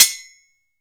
SPOKE HH, SPOKE HI HAT; SPOKE HH 1

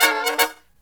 Brass Riffs; USB Soundscan vol.29; AKAI

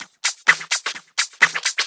FX Loop 128 BPM, House

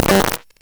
Prikle Snare Fried; AFG Prikle Snare Fried; Cwejman S1; DevSnd Cwejman S1 - JK

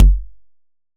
kick lofi

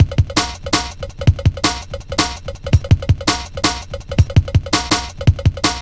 Bull Bell, Drum Loops, Jungle, 165; Jungle Warfare 1&2

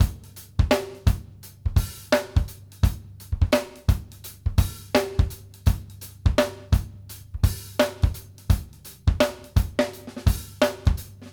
BEAT B, GOGO 84; Sampleheads; New York City Drumworks VOL-1

